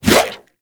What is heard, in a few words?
Sword Swipe, Whooshes